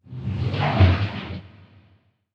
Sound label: water lap; nuke